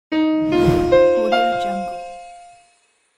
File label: opening logo